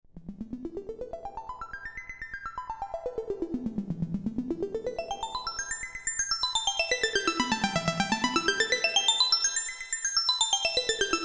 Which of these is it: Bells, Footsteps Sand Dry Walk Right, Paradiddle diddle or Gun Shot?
Bells